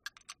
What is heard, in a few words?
clock ticking